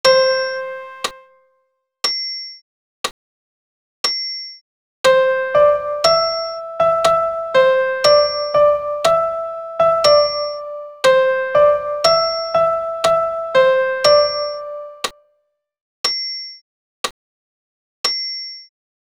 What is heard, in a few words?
Et in spiritum sop pian seul